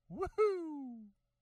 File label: woohoo; angband; ego